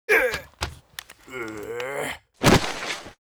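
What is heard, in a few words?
war die, Mob; vaffernipper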